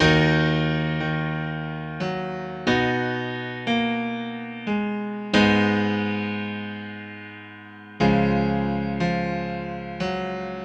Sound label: Grand Piano - 90 BPM (D# Minor); Cloudy Skies; Ghosthack; Free Guitar And Piano Samples